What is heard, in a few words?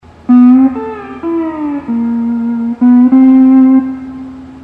hawaiian piece